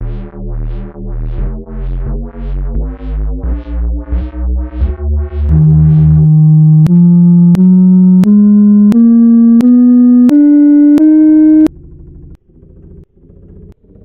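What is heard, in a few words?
B Flat harmonic minor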